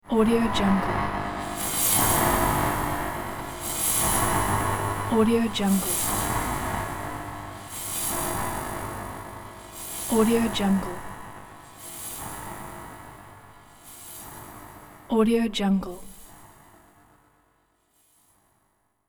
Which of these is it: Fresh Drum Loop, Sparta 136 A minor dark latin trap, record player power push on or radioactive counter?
radioactive counter